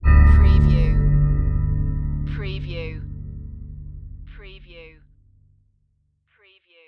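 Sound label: Production Element Hit Synth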